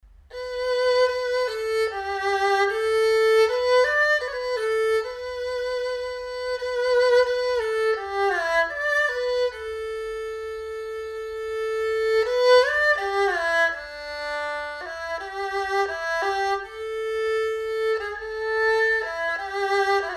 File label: erhu